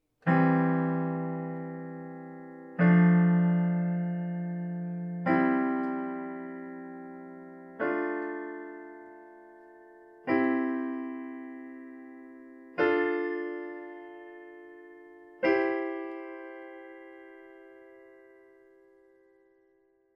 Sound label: Harmonized gospel style